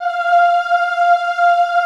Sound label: OHH F4, Classical Choir; Best Service; Extended Classical Choir